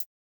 Korg hihat

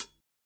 hihat, house

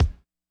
kick, Acoustic

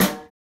snare, snare house

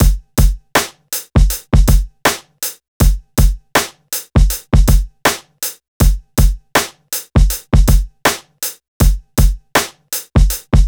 Hiphop loop

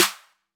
trap snare; Hiphop

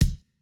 Jrock kick

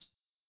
hihat; Reference Beat BPM120; j city pop 1980

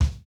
kick, house

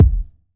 90s hiphop kick; Reference beat bpm90

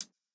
Jrock hihat

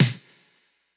snare; pasific; Pop

